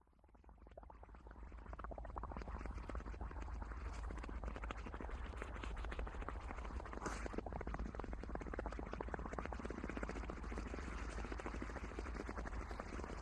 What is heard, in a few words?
Smoke Grenade Under W, grenades; tnt